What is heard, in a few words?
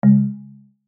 chime low percussive; Chimes; Multimedia; Blastwave